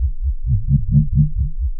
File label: Noise, LFO & Noise